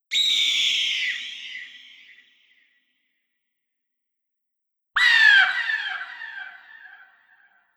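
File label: Yosemite Hawk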